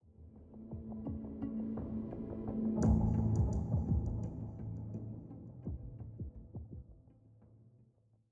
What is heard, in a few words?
85bpm; Legends of Pegasus; windows gamerip 2012